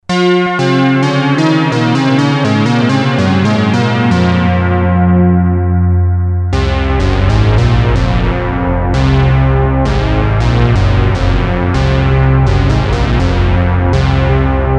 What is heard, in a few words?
mighty wizard, TriVCO